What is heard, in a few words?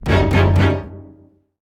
sting Shock